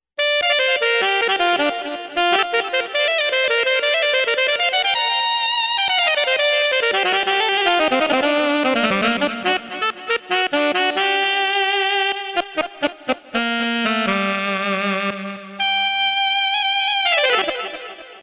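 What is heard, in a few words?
tenor sax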